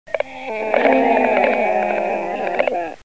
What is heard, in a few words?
Ciconia nigra